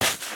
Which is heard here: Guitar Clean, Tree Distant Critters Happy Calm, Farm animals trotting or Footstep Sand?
Footstep Sand